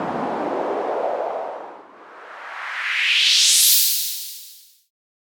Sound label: Very Short Sweep, White Noise